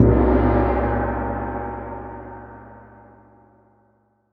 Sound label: chinese gong; AKAI S6000 CD-ROM - Volume 5